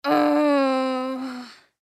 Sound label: Human Moan; Moan Long Male Twelve Yrs Boy; Pain; Blastwave